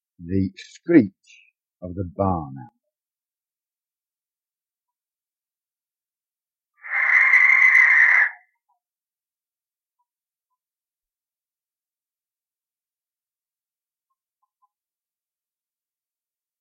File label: Adult Barn Owl screech